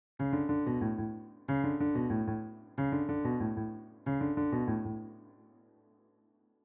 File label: PIANO